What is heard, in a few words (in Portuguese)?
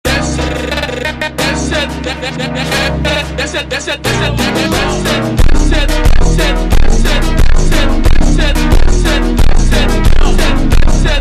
ultra Slowed